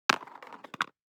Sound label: DICE on Cardboard, Throw and Roll, Standard, 3 Three Dice; Samples; ArticulatedSounds; DICE Sfx Party (Extended)